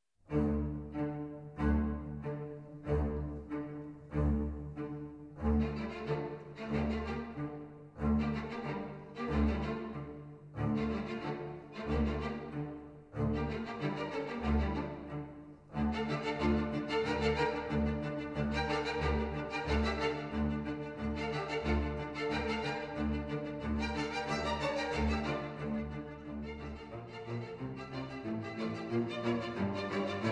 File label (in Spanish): Música